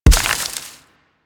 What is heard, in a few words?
Nechro Mech Step Surface Foliage; Step Surfaces